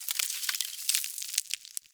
Venus Landscape Ice Slide Small; Warframe; Venus Open World; Ambience

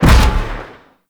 Void Dragon Orb Charge Explosion; Enemies; Abilities

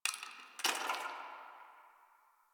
Ice Crack One Off

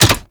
Ball Catch; Warframe; Missions; Tenno Ball

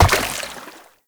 Step Water, Movement; Uriel; Warframe

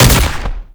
Arcade Automata Impact; Gameplay; Warframe; Nineteeen Ninety Nine; Deep Archimedia

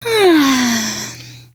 Med Sigh, Daughter, Vocalizations; Warframe; Deimos

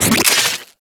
Energy Pickup; Warframe; Pickups

